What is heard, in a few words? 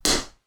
Slap